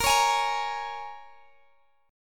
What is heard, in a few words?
A sharp Minor 7th, Guitar, Open G